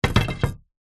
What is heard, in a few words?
sport pool cue set down on pool table